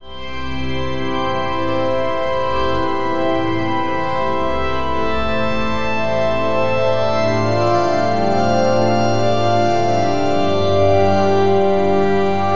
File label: calm magical fantasy musi, moonlit